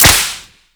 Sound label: Whip Impact Default; Warframe; Grineer